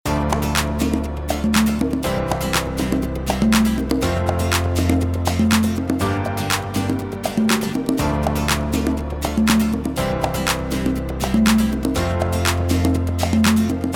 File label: Upbeat